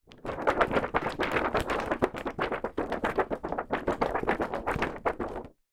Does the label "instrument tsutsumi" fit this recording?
No